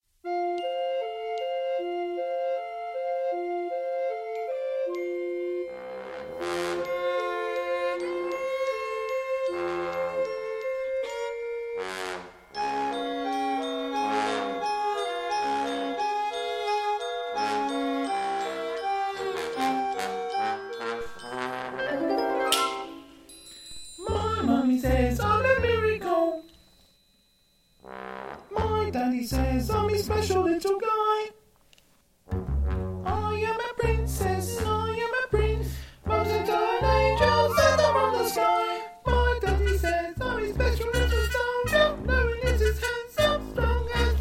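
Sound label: miracle with vox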